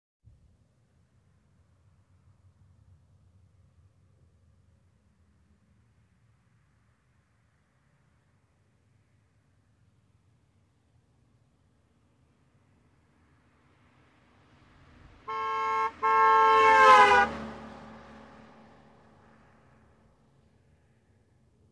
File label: Car driving by and honking